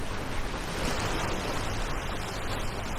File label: lake waves